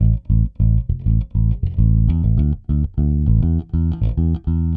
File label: Pop, Bass